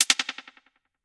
Artifact, Percussive; Ableton; Glitch and Wash

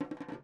Djembe bounce; Convolution; Instruments; IR Samples; NI Kontakt 5

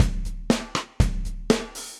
Kit, drums; ResoClassic; Ableton; Session Drums Club

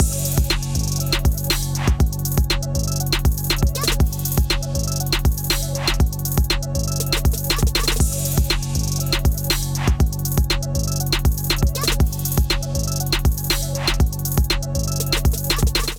Takeover Kit, Drums; Ableton; Beat Tools

